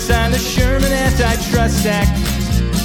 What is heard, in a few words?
music; wu xing; earth